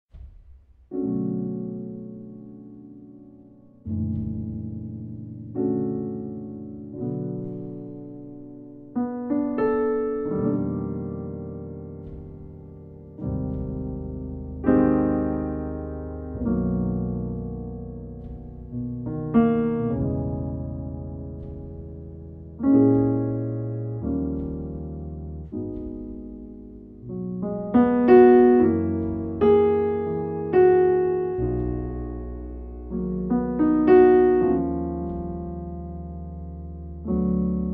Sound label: Relaxing Jazz Music Instrumental